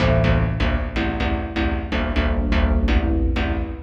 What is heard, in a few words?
DEEPHOUSE ORGAN 125 A